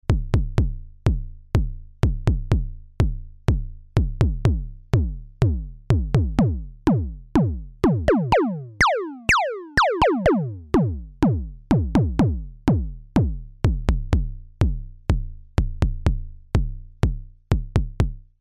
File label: MODOR DR2 NOISE BD